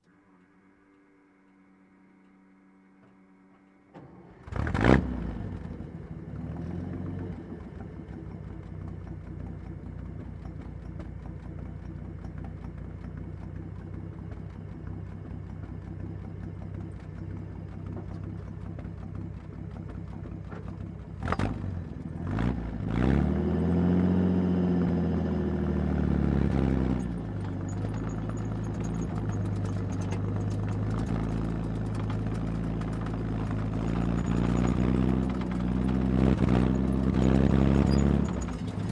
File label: Tank engine sounds in distance